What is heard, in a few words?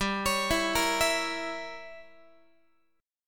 G Diminished 7th, Guitar; Gdim7 arpeggiated; x,15,16,14,14,15